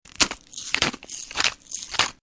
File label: Footsteps; SAPO Scratch